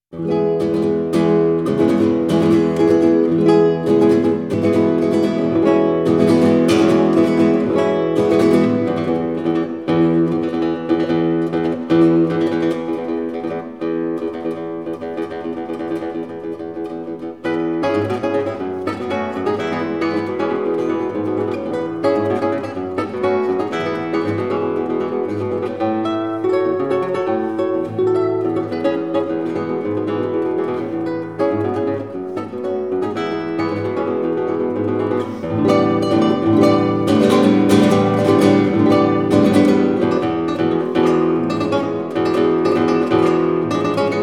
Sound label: Batucada; Isaias Savio; The Spanish Guitar